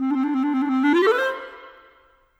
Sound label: Clarinet; Sonic Foundry (Sony Creative Software); Orchestral VOL-2; Modern 14